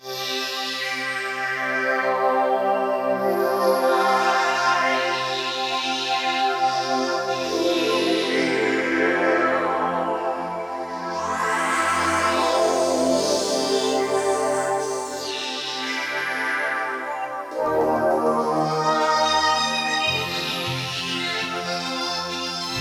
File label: Formant Morph, Korg Radias